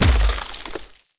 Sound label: damage armour final